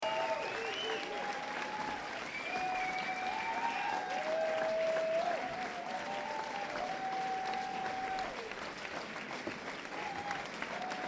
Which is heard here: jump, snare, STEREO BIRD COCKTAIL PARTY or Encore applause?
Encore applause